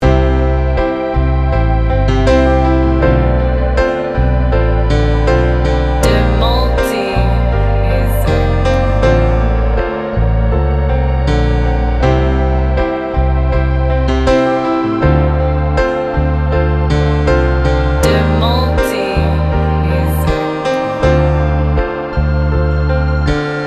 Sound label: instrumental pop transition; Lagu ini punya vibe perpindahan, seperti momen ketika hari berubah dari sore ke malam. Musiknya bergerak perlahan naik